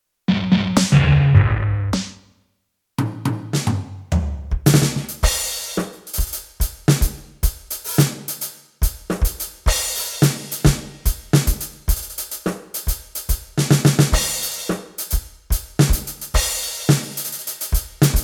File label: Acoustic; Acoustic II